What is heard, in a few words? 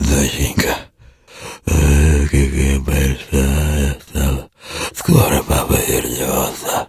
mzombie idle; STALKER; customnpcs